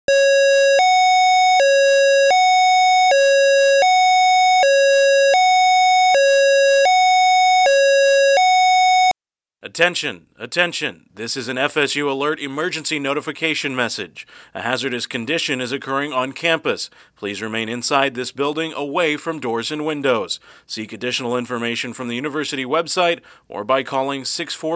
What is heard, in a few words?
Hazardous Indoor